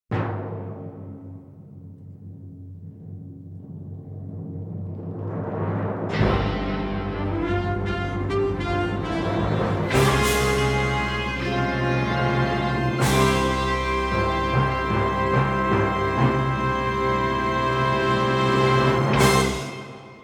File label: Fanfare; Network Production Music; NM092 Above & Beyond (Multimedia)